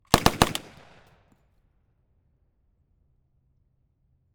M3 Submachine Grease Gun .45cal 0.3m to side Triple shots